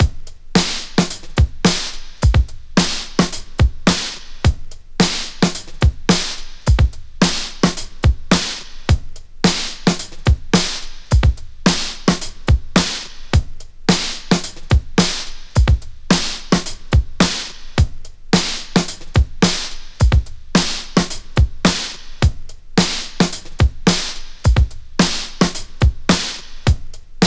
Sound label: 108 Wet Hard, Breaks; Lockdown Breaks